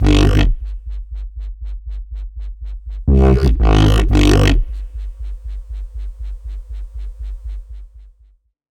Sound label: Neuro Warp, bass synth; Preset: Neuro Warp; Wave Alchemy - Bassynth 1.1.0